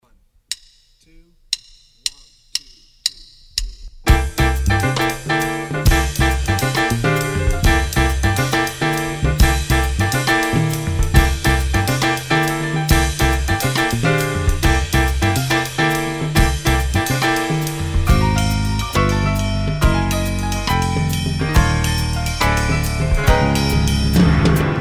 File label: with drums